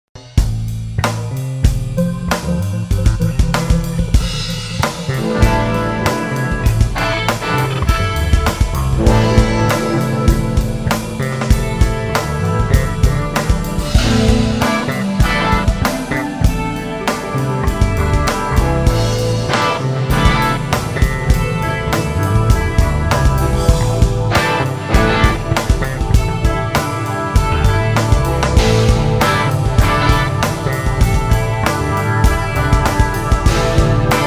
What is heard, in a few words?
Echo Waves